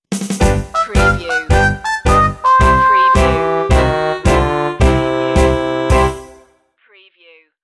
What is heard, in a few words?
straw hat stinger